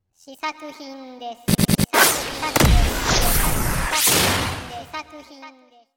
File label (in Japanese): 効果音